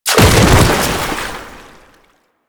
Bodyfall Water; Warframe; Lasrian; Lasrian Soldiers; Foley; Body Falls

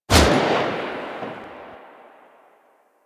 Burst Rifle Fire; Weapons; Grineer

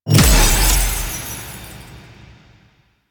Platform Break; Warframe; Cephalon Weave; Weave Boss

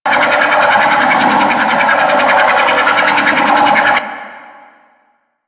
Void Lure Loop; Generic Abilities; Mods

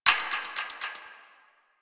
Hack Minigame UI Time Tick D

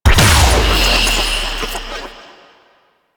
Death Explosion; Enemies; Warframe; Vomvalyst; Teralyst

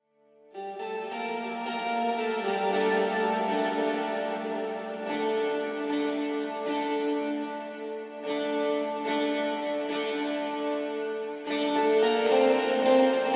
Hub Amb Cue, Music; Warframe; DE; Dynamic Music; Entrati

